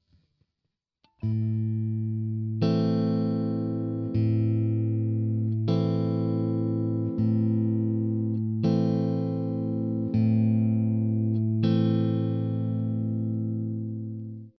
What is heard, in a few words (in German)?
Zupfmuster, gitarre